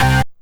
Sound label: Synth Hit C